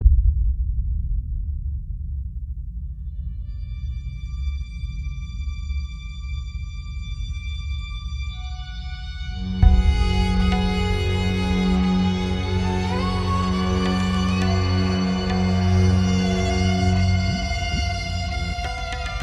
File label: LOOP; Strike Suit Zero